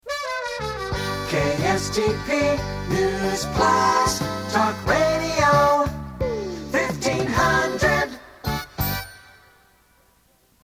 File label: Jingle; KSTP AM; 1982; News Plus